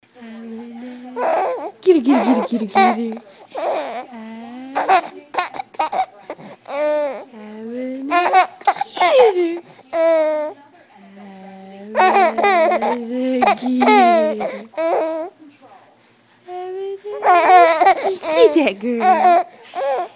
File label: Laughs